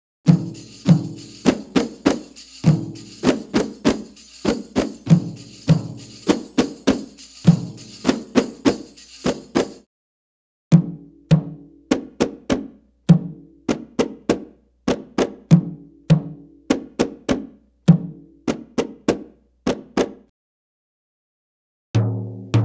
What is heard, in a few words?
Arabic Rhythms